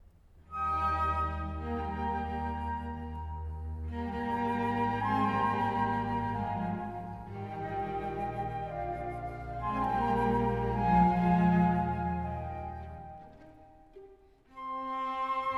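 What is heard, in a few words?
Chamber Orchestra Symphony in D Major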